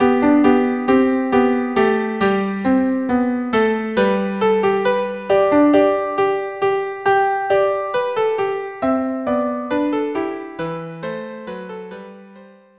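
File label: march 2 violas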